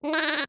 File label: sheep mbeeh mbeeh